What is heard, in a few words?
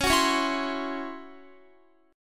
Db Minor Major 7th Double Flat 5th Db down Guitar; strummed